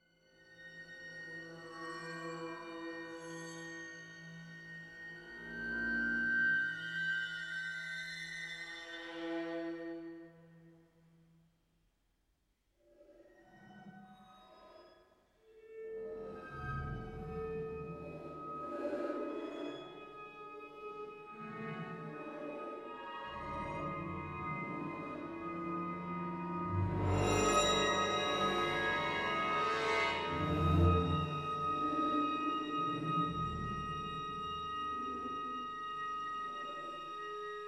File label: classica